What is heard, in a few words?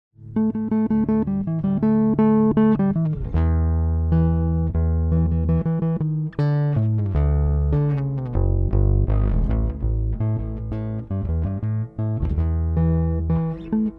1971 SB-400 bridge, gibson bass